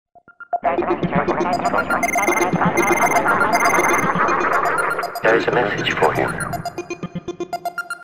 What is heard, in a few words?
Message